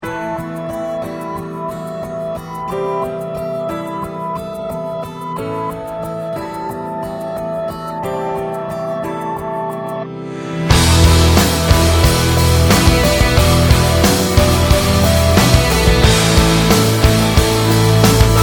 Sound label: rising